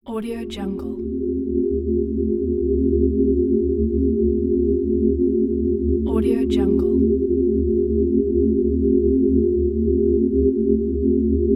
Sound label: tonal atmosphere